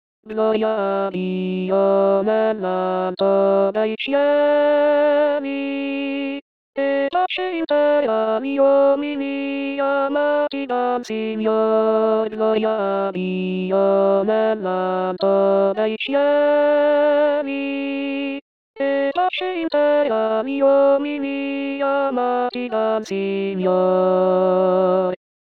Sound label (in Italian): Gloria a Dio Voce; anno liturgico